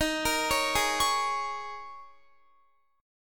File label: Eb 7th Suspended 4th, Guitar; Eb7sus4; arpeggiated